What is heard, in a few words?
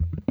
JAZ NOISE, guitar; Quantum Leap - Guitar & Bass